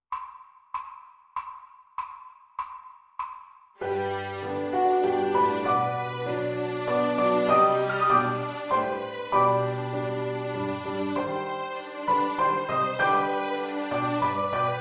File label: Backing Track